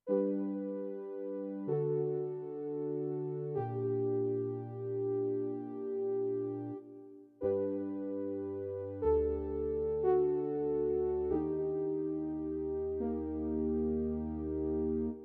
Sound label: Soft Brass; XG